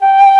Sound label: TRON FLUTE; E-MU Formula 4000 Series Vol. 5; Protozoa